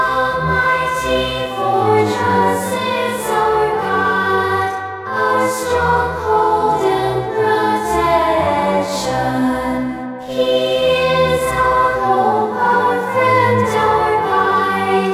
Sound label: choir